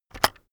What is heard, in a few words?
keyboard external enter press very fast heavy